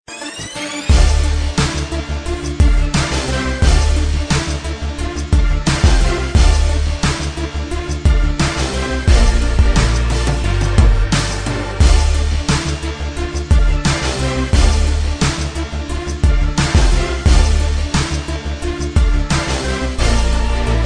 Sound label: hip hop beat